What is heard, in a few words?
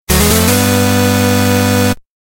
Msg Tone